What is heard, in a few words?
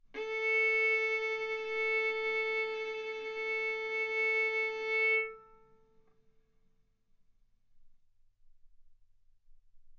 Violin arco A4 B4; mf; stereo